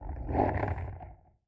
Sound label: Small ambient dogs; STALZONE